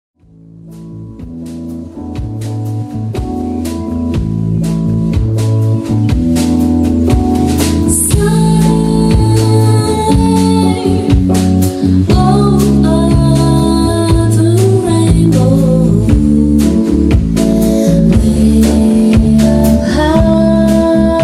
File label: live bossa nova cover